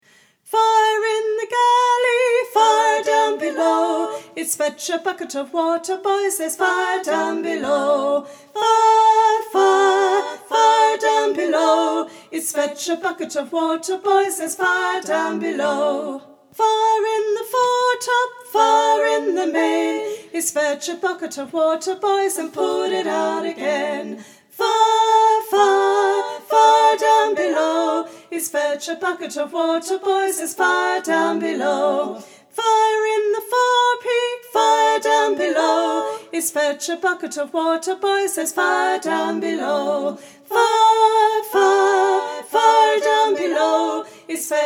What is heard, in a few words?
Fire Down Below Part 2 Melody, choir